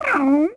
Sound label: curiosity, Catz